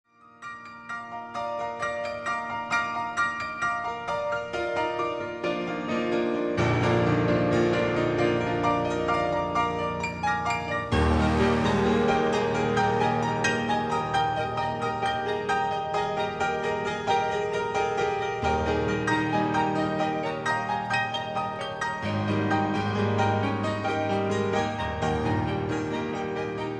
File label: Grand Pianola